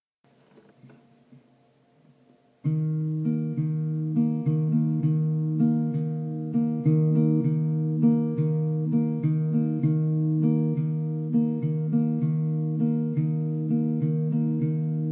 D 100 Acc GTR chill groove

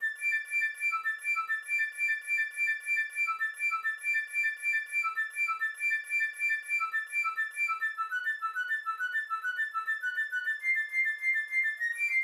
Flute

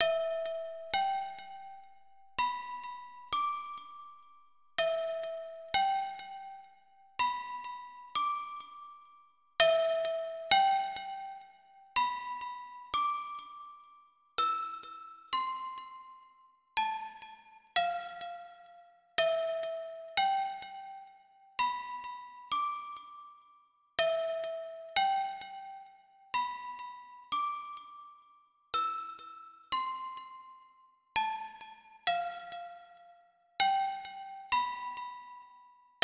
music box 100bpm C

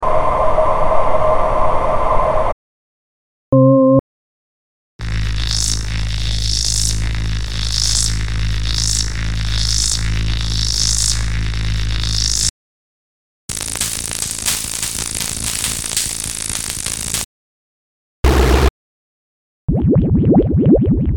Loops; Apoxode Loops 01-16

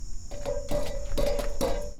perc

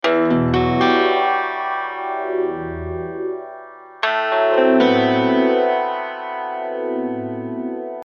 12-String arpeggios

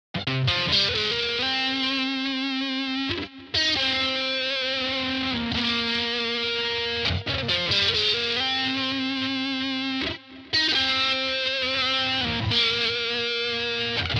Psychedelic Stratocaster; admiralbob77

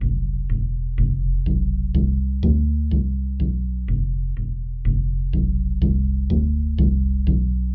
bass

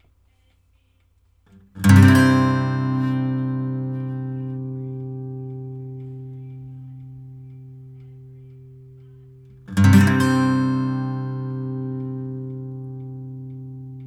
acoustic guitar

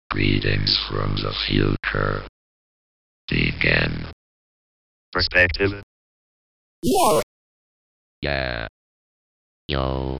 Experimental Downtempo

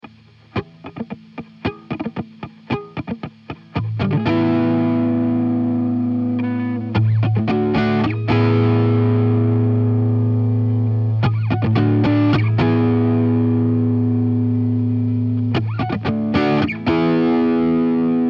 E 112 GTR Riff Trashed

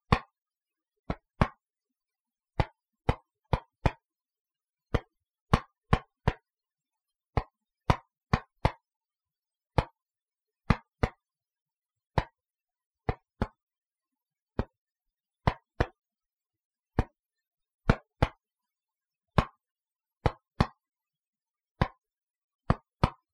Perc; Collabunity Perc Two

